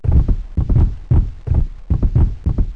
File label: ele flup